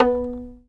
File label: fiddle pizz B3 R; Sampleheads; Whole Lotta Country 1 & 2